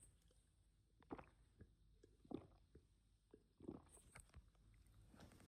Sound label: Swallowing Water for Chugging Water POV